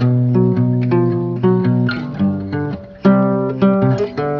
guitar sour tones, Loops

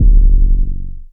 Kicks; Heartbeats

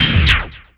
power down, Vinyl; Misc Percussion

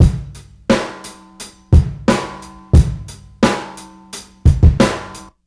groove, Drum loops Boombap

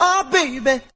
"ah baby" male SUNG; Voice; ooh ahh

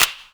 click clock; Snares

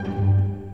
pizzacatto, String

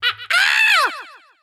"ah ah"; Voice; ooh ahh

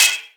Real Open, Hats

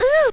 ooh female scratch, Voice; ooh ahh

